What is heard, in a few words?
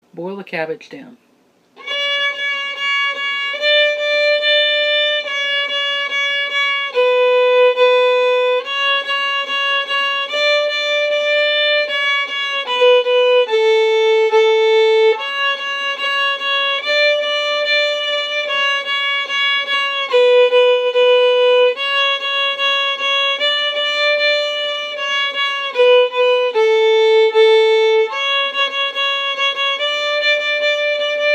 Key of A; Bile Em Cabbage Down